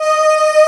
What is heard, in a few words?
E2 G3 VOI; WORS STATION; DANCE #3